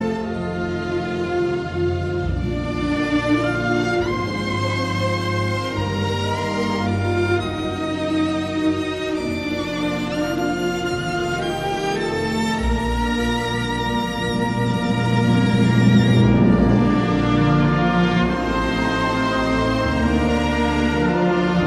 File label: austria